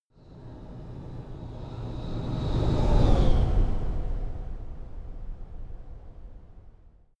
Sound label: sci-fi vehicle train passing long; raumschiff